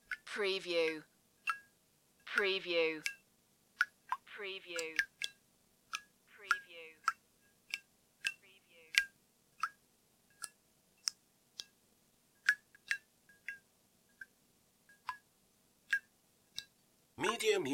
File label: Water Drops